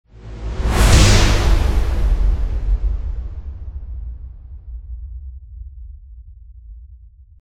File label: Transition; Everspace 2